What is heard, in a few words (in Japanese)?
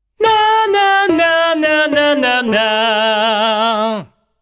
ミックスボイス